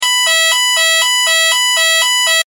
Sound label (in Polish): ton